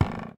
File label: Footstep Wood-Creaky